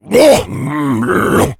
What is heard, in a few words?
shoved, infected